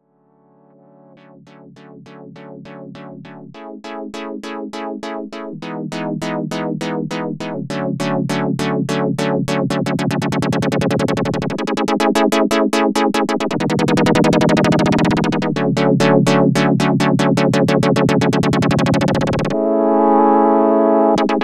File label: Roland SH 01A Chord